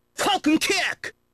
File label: falcon kick